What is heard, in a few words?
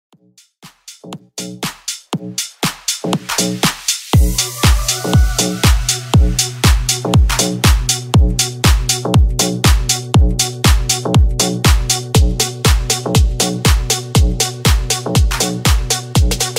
EXTENDED 120 BPM; REDRUM